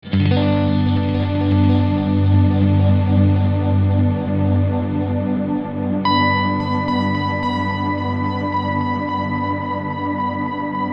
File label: guitar long decay